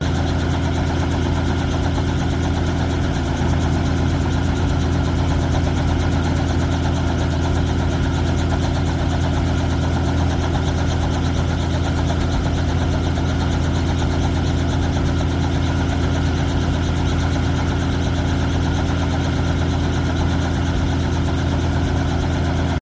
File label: idle helicopter medium loop